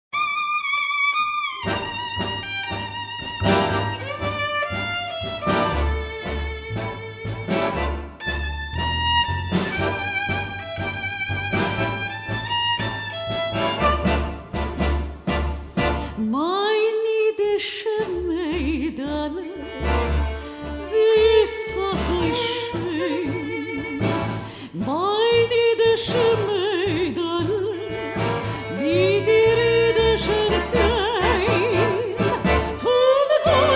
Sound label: tango